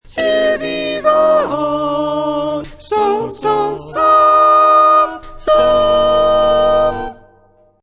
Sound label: sop, chorale